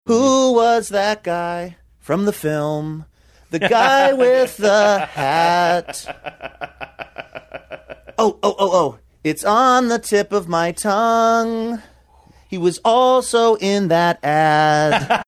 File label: singing who was that guy from the film